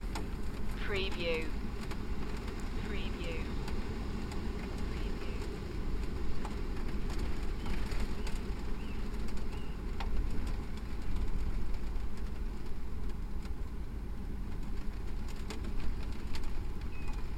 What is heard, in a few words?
Motorhome rain ambience; beanstalk audio